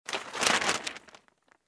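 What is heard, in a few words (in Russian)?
встряхивание газеты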